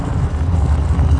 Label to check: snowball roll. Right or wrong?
Right